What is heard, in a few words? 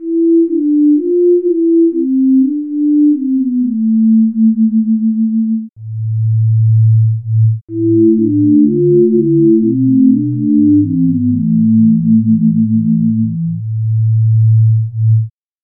whistle